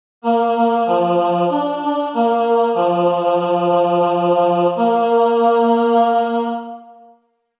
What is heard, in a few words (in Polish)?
Sygnal mysliwski Dla pudlarza; wicekrol polowania